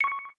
Pound, Keytone sounds; LG GD550 Pure

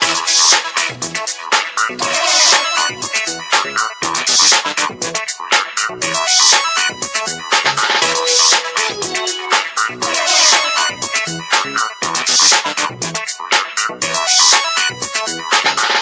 More polite

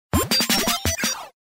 power off game

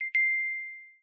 Merlin Chime; Palm Treo-Pro

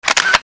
camera shutter, Sony Ericsson; Z520i

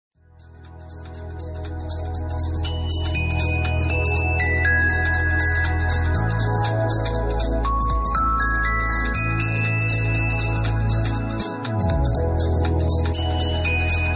Blue Celeste; GD550 Pure

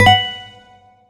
bbm ping; BlackBerry OS-10

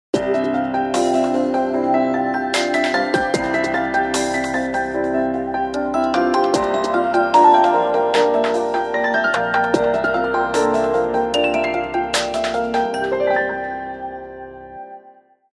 Morning Dew, alarms; Meizu MX2

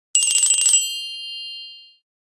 Tinkle; Nokia; 7270

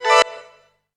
notifier rewind; ZTE Open-C

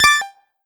notifier ting; ZTE Open-C

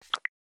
pen attach, LG Stylo 4 Plus ui

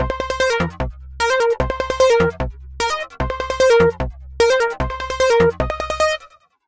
ringtone; Google; Android Open Source Project

